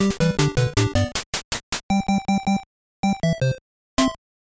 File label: Race Start; Super Offroad